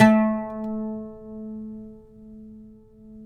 PLK OUD A; Roland - LA Composer vol. 3

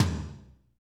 TOM, KIT Real-Kit; Real-Kit s12

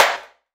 snare, Hardcore Breakbeat; Big Fish Audio; Hardcore Breakbeat Action

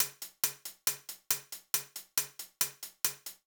Beat Hats, Grime, 138bpm; Zero-G Sound Sense Grime Scene; Construction Kits; Da Suspects

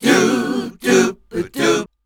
Voice Spectral, H PHRS

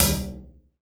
Hi Hat, drums; Sonic Foundry (Sony Creative Software); Drums & Drones; Construction Kits; Ventria Kit

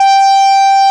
G4, SYNTHESIZER ANA LEAD; AKAI S6000 CD-ROM - Volume 1

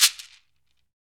SHAKER, Afro Shakers, Shake&Rattle

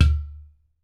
UDU TONE, African Perc

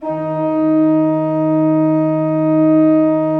16-8 D3, Cathedral Organ; Propeller Island

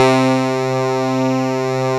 Juno Clavi; Club-50 - Foundations Roland; Clavinets

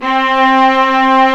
STR, Vlas Sect, Agitato

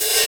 Ride; Equipped Music; Loungin House

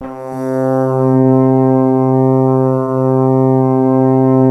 ENSONIQ VFX DARKERN; Rubber Chicken Software Sample Bank

